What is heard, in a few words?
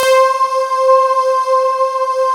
SIGH C5; Big Fish Audio; Synth City; COMPOSITE